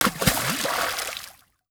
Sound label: Splash; Treasure of Nadia